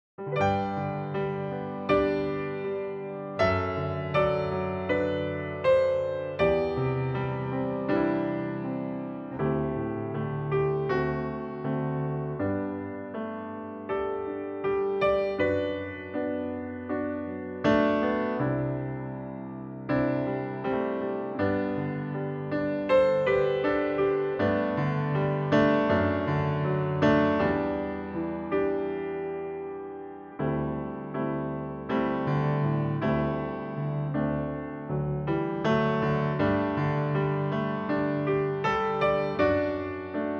G piano; key - G - vocal range - G to A

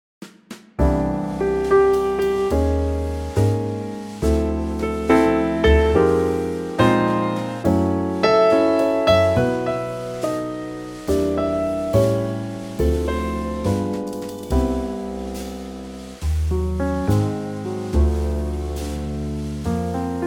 Trio C

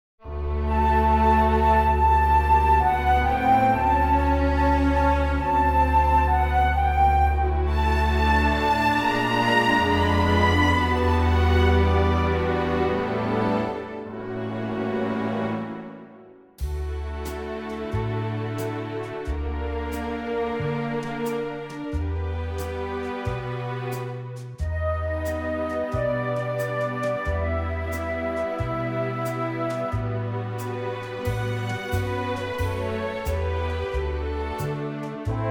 D orchestral; key - D - vocal range - C# to E

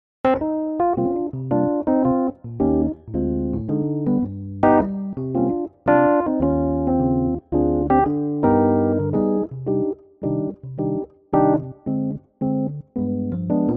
Rhodes Cm; key - Cm - vocal range - E to F; Superb Fender Rhodes piano arrangement, at a great tempo

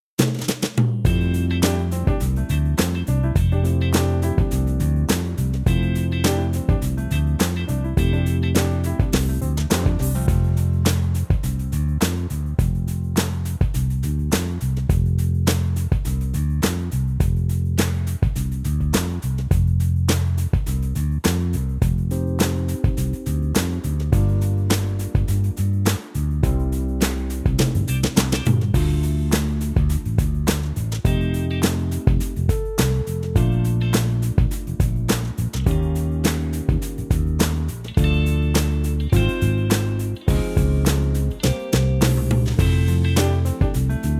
groove; key - F - vocal range - C to Eb (optional F); Fantastic groove arrangement

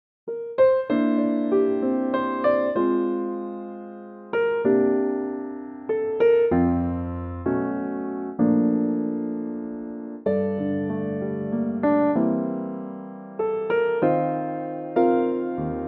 Bb piano; key - Bb - vocal range - Gb to Bb

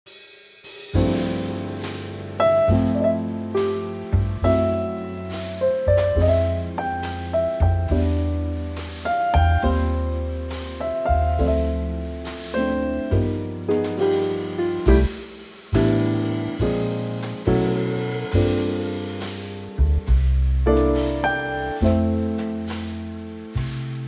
Trio C

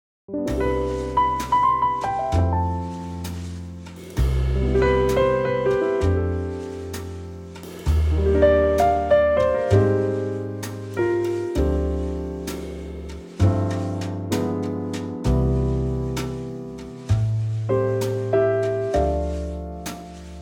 C trio; key - C - vocal range - B to D; Beautifully intimate trio arrangement of this great old standard

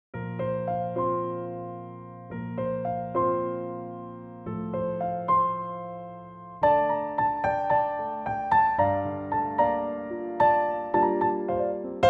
piano trak swing

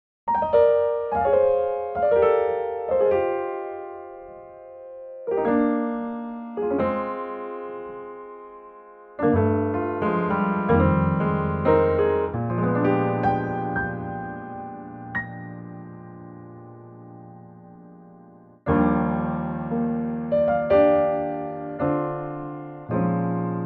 Eb piano; key - Eb - vocal range - Bb to F; Gorgeous piano only arrangement

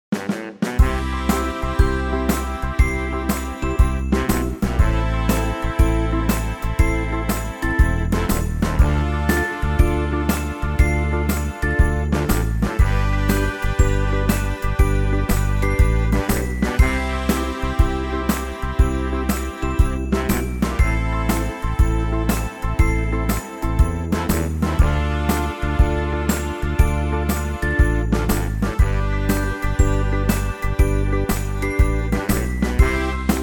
swing; key - C - vocal range - G to A; Swing arrangement

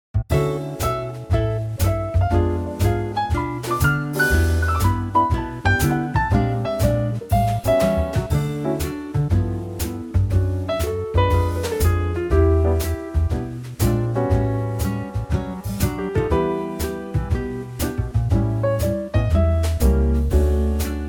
quartet D

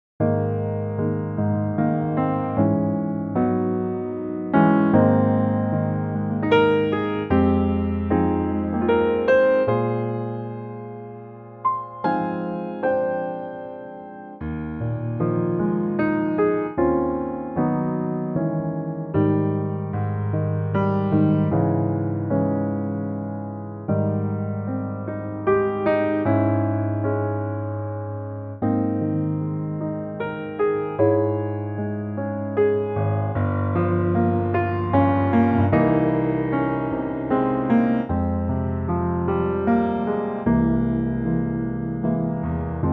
piano Ab

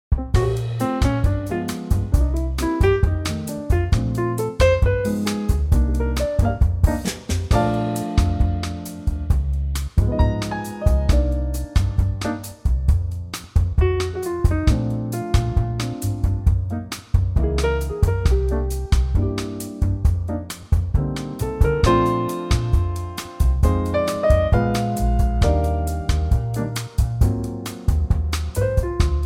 Bb trio bossa; key - Bb; vocal range - F to Bb; 2019 Trio arrangement

